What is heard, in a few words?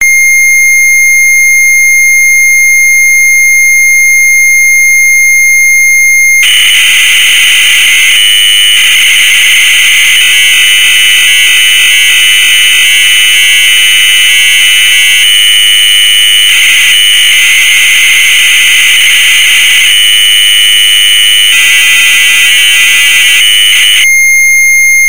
load tape prog; z80 software